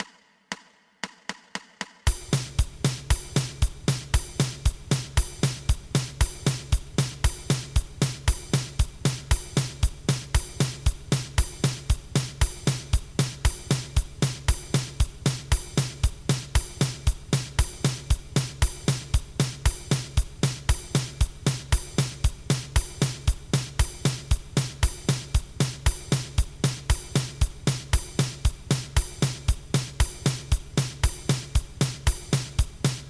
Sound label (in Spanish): 2 pulsos